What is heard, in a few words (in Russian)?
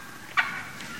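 индейка одиночный скрипучий близкий шумный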